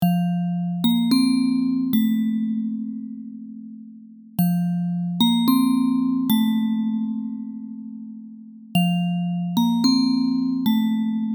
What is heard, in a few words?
Not Tired Bells, Ambient Bells